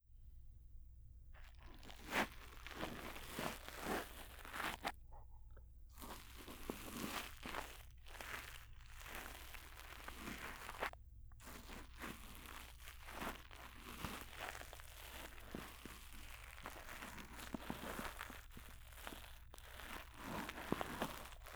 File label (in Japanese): 耳かき; 【睡眠導入】ゼロ距離音セレクション オノマトペ式ASMR